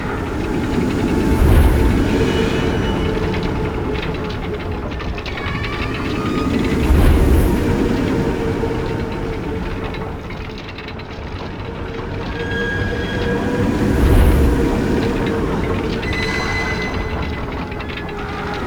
Windmill Loop; Ambience; Props; Warframe; Duviri

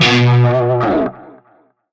Shawzin Flourish Oct One B; Music; Warframe; Narmer Shawzin

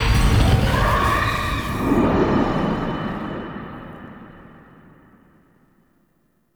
Tomb Player Action; Dagath; Warframe; Ambience; Clan Dojo; Dojo Props

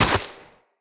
Walk Snow, Footsteps; Operator

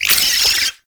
Brood Spider Vocal; Warframe; Oraxia

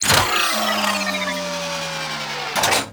Rolo Dex Close; Ambience; Props; Corpus Ship

